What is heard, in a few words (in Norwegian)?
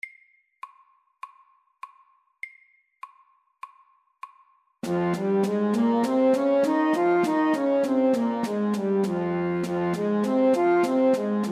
F moll natura FORT